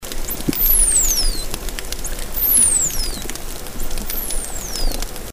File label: dolphins signatures; SW Dana